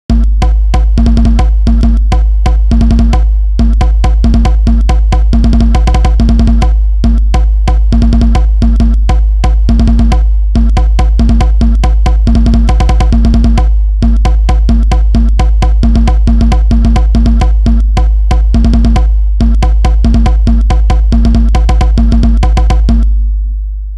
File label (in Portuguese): atabak com grave; DJ JHOU